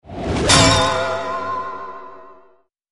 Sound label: Cartoon Arrow And Hit Alert